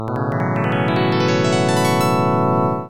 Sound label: ripple 12 ET